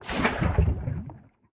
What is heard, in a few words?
fluid empty viscous; TFC Quick Pockets